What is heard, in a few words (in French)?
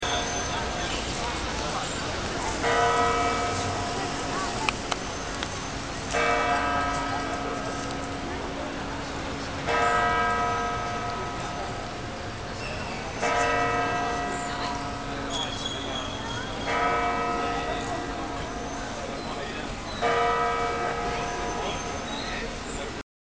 Cloches; Messine